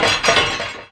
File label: foley, footsteps; rattletrap